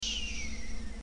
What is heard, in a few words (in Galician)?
planear